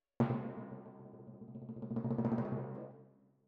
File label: Timp Close